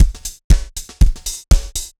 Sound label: dance floor tight 120, loops